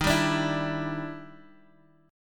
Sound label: E Minor 7th D sharp; Em7/D#; strummed; JGuitar